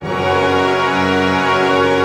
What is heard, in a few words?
PENTA F# FF, orch penta; Sound On Sound - Peter Siedleczek's Orchestra